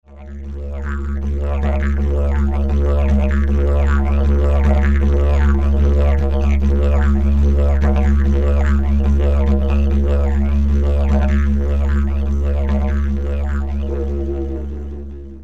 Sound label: bamboo, Bambus-Didge, Ton: C#; Eigenbau